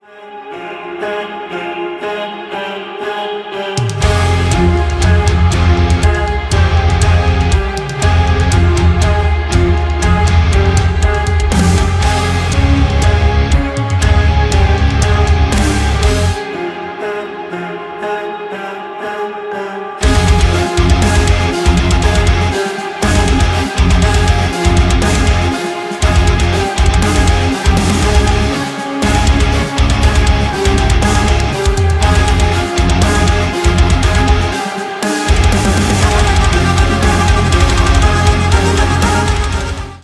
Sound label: metal cover; squid game